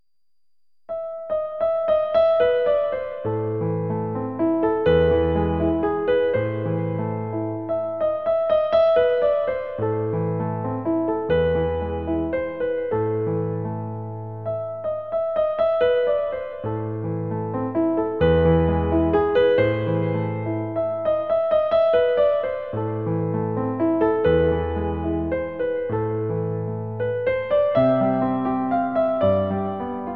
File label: Fur Elise clav